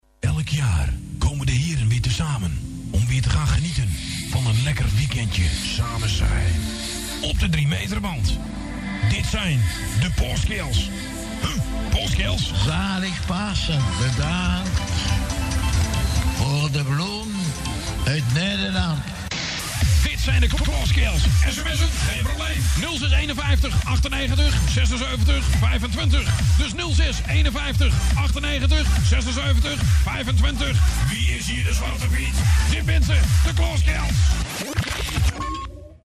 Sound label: Jingle; Kloas Kearls; Audio Fragmenten; Arkan Radio 2